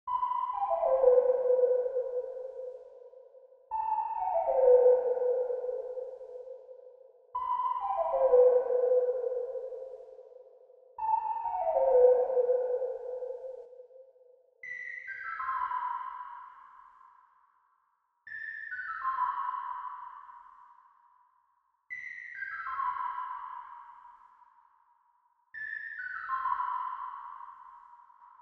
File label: 132 BPM E Min Arp; Cymatics; 2022 Melody Collection; Hero